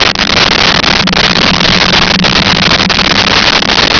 passby smallship loop

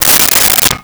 Pots Pull Out; Kitchen; 1001 Sound Effects

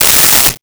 Hydraulics, Mechanical

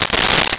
Silverware in Hand, Kitchen

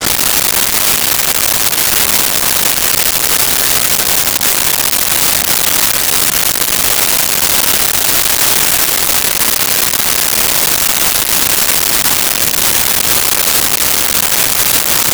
Fire

